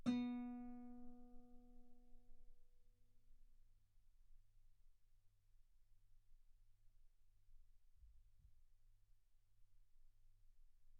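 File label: Guitar ff B string B3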